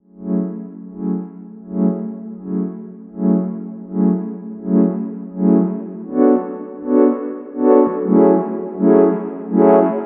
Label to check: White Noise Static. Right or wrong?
Wrong